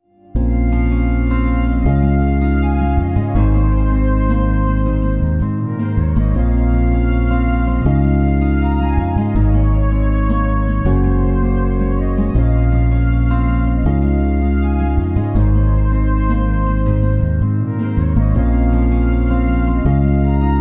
romantic background music; hum tum drama; maha and sarmad

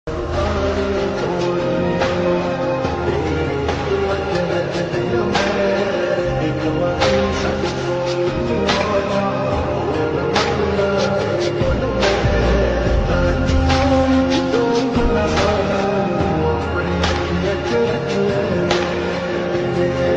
love ringtone romantic ringtone

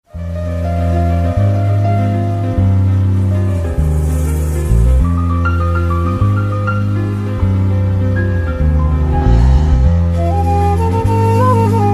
bgm ringtone, music; nayanthara

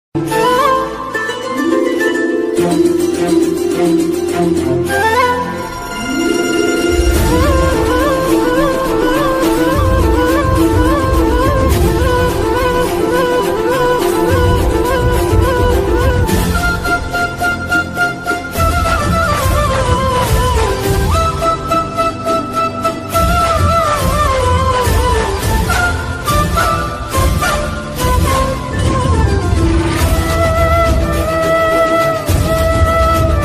radha krishna flute ringtone

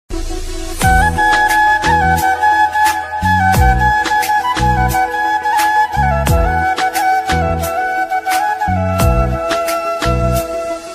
assamese bahi ringtone assamese flute ringtone

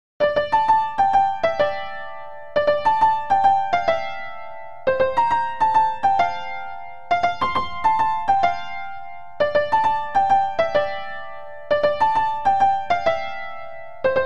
instrumental ringtone music

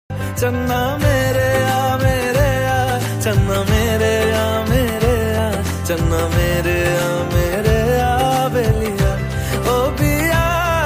bollywood song ringtone; film ae dil he muskil

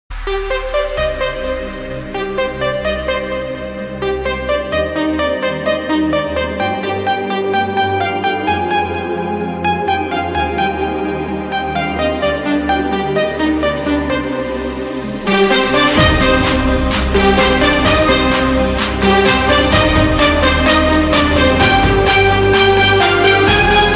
instrumental ringtone edm; money heist

